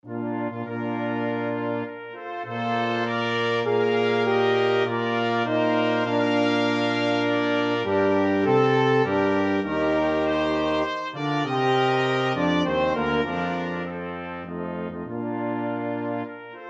mendelssohn nocturne, brass quintet

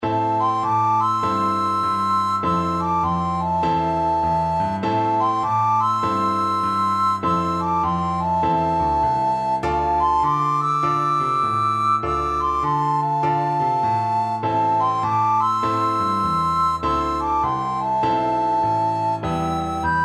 recorder duet

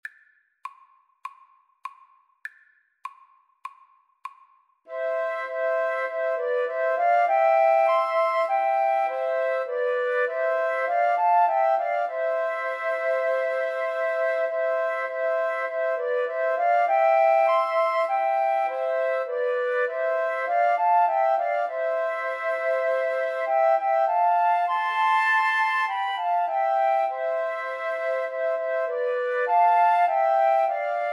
savior like a shepherd, Flute Trio

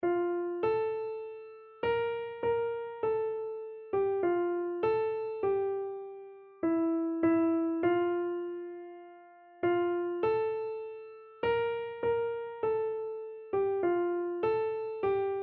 my jesus, keyboard

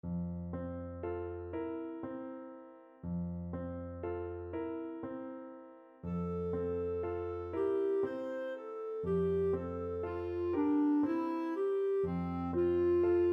berceuse, clarinet

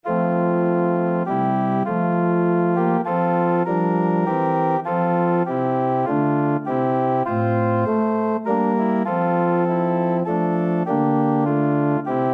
come thou, organ

